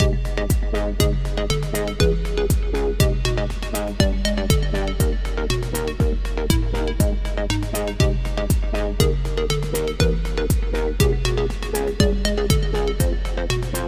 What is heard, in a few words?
Melody